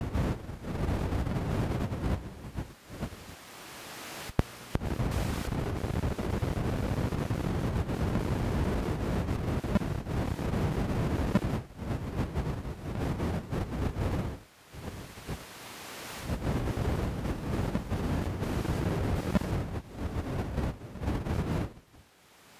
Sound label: CDMA1x data rev